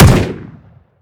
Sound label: fire; gmod; viper; weapons